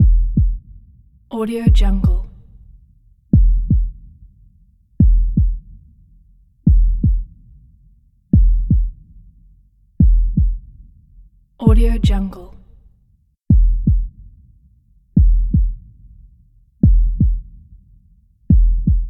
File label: heartbeat